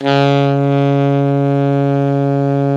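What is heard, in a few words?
ALTO pianissimo C#2, Sax; Giga Samples Collection; ALTO SAX PP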